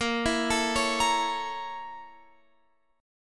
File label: A sharp Minor Major 7th Flat 5th A sharp Guitar Open F; A#mM7b5 arpeggiated; x,17,16,16,16,17